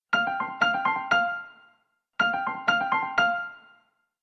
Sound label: Ringtone; win7